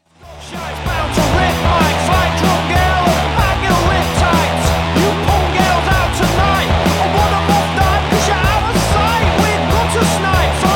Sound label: break